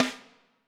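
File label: snare drum, Drums; Bob Clearmountain Drums I; SD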